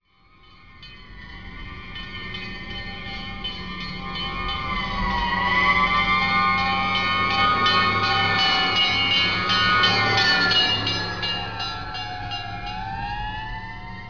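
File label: firetruck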